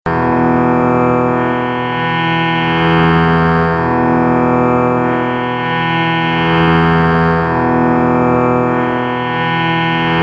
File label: Sruthi E